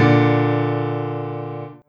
Two Bb2 D3; osu

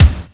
drum thing

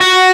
prebend; osu

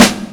Drum

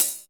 Hit Med Click

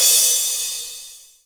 drum hit finish

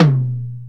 TOM; osu

